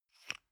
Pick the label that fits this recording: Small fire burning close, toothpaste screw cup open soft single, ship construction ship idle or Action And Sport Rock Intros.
toothpaste screw cup open soft single